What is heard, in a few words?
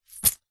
purse pouch leather coins inside catch